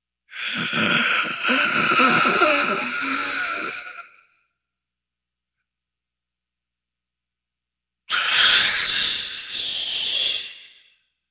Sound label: Last Breath; Halloween Havoc - Sound Effects; Ktel